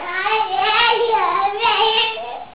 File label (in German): GESANG